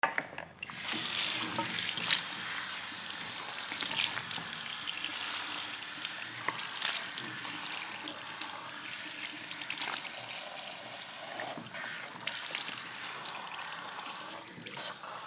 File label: hair rinsing